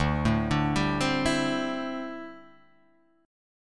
D 7th Suspended 2nd Suspended 4th D, Guitar Open D